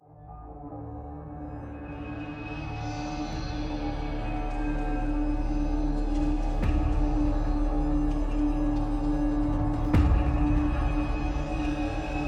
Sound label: Moonlight Cicadas; Cookies Slayer